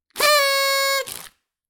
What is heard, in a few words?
party